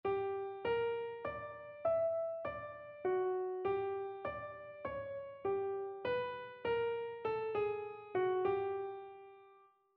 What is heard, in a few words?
melodic error detection